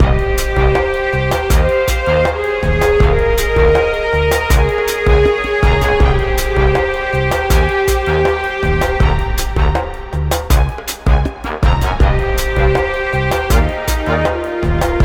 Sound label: Ignis Volcano Loop; 音楽素材; 火口から溶岩が流れ出る、危険な火山地帯。